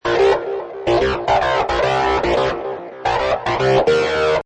Distorted Guit Short Chorus Riff A, Funk Electric guitar loop in A# at 110bpm.; Guitar Loops